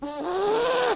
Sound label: punch; dod